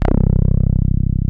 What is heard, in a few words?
TB - 303